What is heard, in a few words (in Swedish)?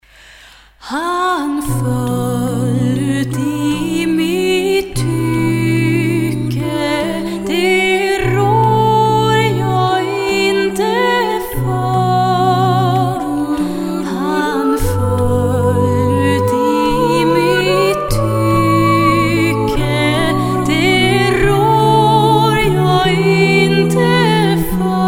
musik